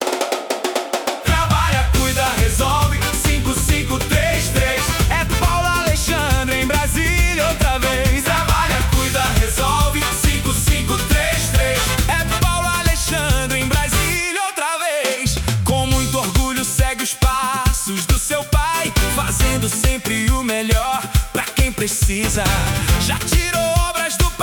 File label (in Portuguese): jingle campanha